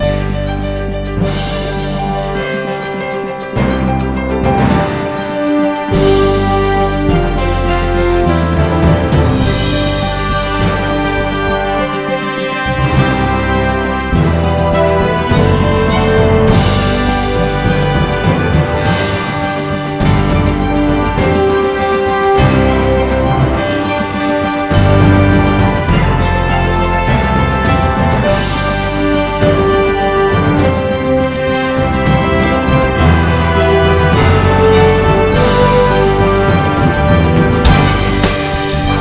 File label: Production Music Inspirational Orchestral Themes